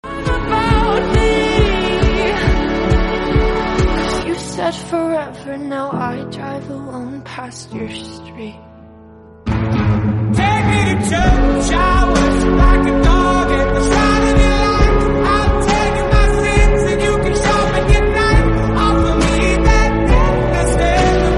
instrumental song

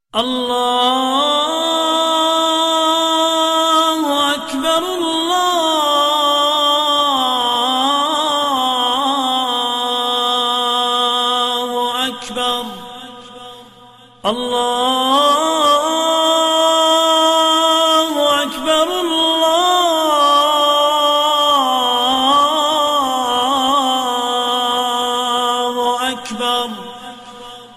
azan, islamic, ramzan

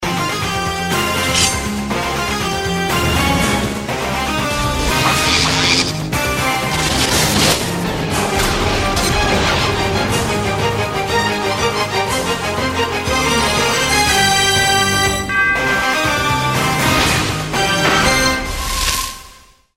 theme cartoon; x-men

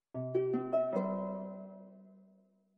sms harp

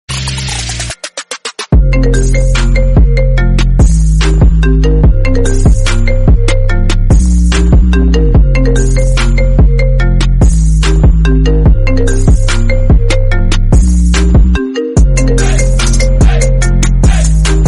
Apple iPhone

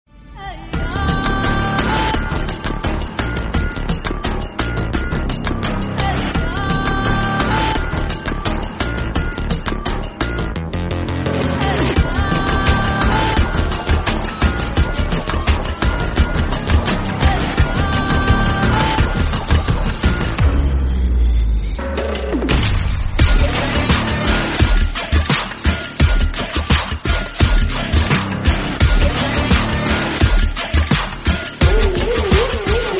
remix bgm; beast x master

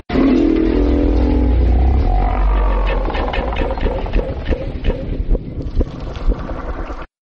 female roar; muto

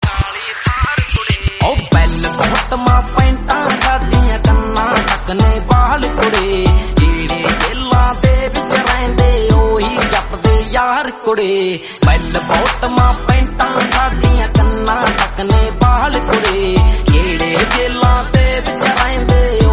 instrumental

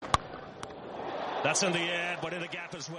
bat amp ball hit notification

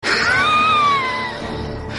laugh notification; kirmada

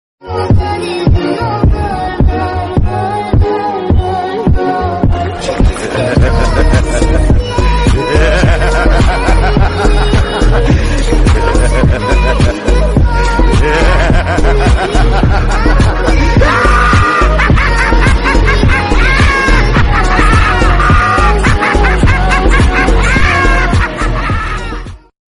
funny laugh theme; joker

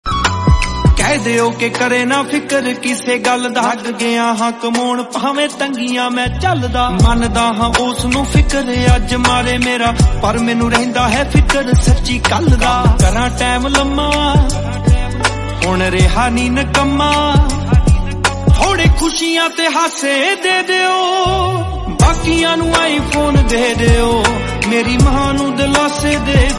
punjabi

